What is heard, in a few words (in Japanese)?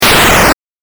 8bit 消滅